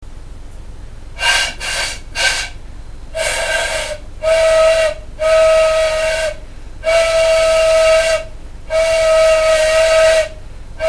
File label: flute; adult violin